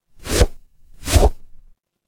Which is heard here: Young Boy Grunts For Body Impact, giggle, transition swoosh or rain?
transition swoosh